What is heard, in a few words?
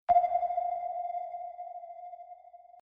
submarine sonar